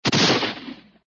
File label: gun shot; hannah barbera cartoon sound fx